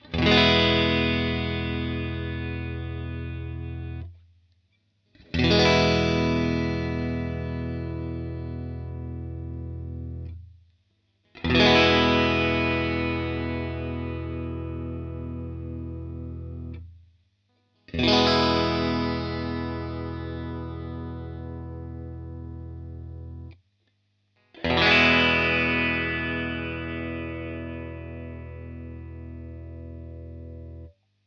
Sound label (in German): Pickuppositionen